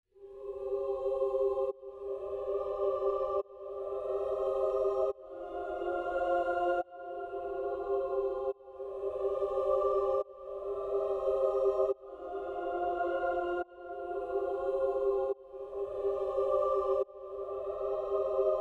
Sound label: 141 BPM C Min Choral Pad; Cymatics; Red Eyes; 2022 Melody Collection; Drill